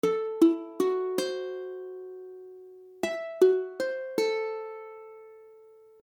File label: Fism7b5, akordy ukulele; F#m7b5